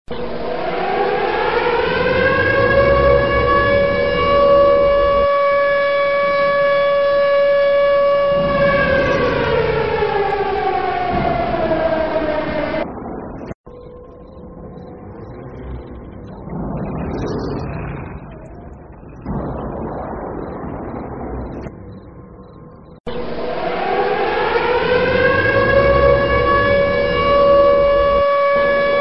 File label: tornado; thor